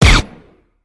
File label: beretta 92